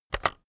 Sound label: keyboard external enter press fast heavy